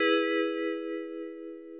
Christmas Church; Question; Christian Desktops for Win98

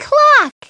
Clock; Treasure Galaxy; Learning Company

